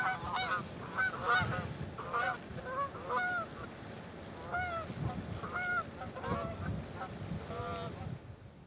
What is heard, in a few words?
geese honk far